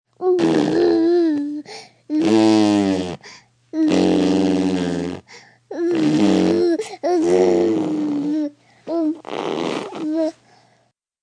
Baby Raspberries, Baby sound, 5 months, long, gasping, raspberry, several, human voice, kid; child, infant